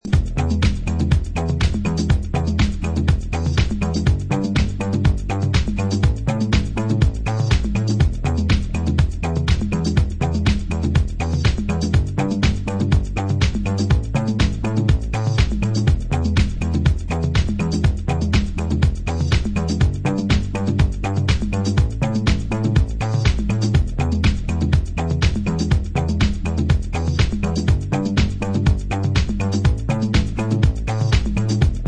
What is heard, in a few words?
bass the beat